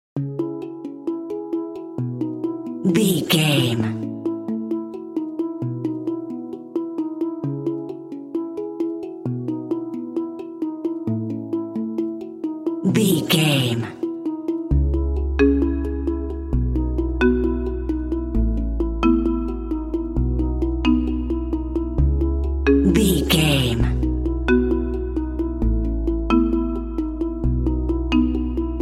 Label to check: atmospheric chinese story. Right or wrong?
Right